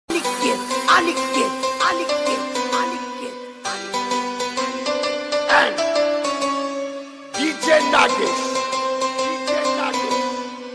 sound ringtone

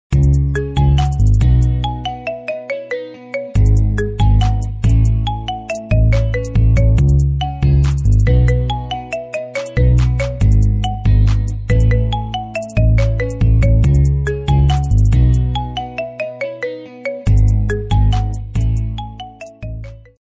marimba cover marimba ringtone

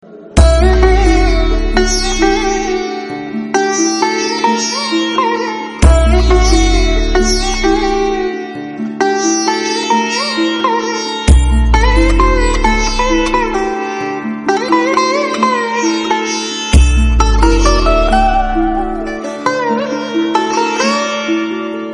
beautiful music ringtone

